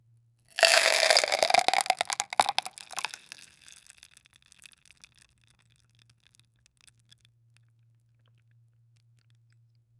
imagine a stream of ouzo; beverages

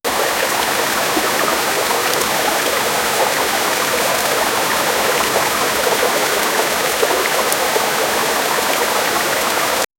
sound of water flowing in, river

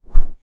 2d game sound with a

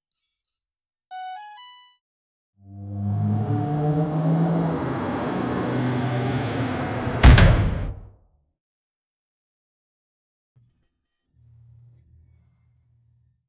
smart automatic gates open

